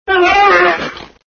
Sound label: Voices sound (saber yelp)